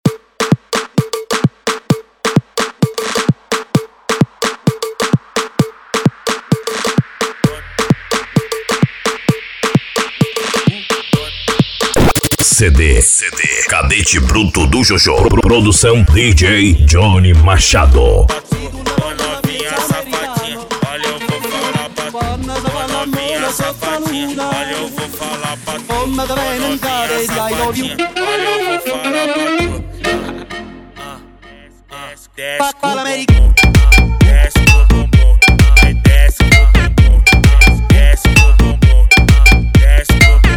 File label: electro funk